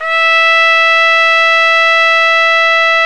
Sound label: TRUMPET; Best Service Gigapack 1